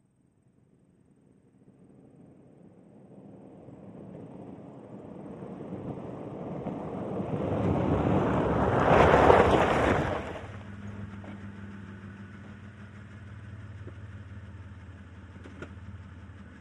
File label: Montero App Med Off, 1998 mitsubishi montero ext.